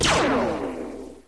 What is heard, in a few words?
Plasma Bolt